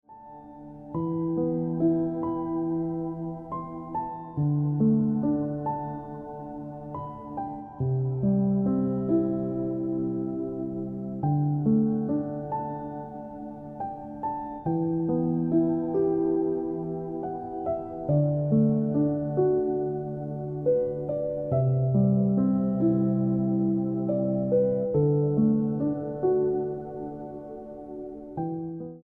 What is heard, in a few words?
Piano Music